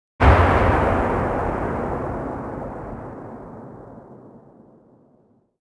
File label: expl mid tail, explosion; World of Tanks Blitz